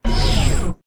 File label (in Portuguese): Porta; leuser